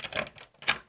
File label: unlock Door; Zomboid 41.78.16; Project Zomboid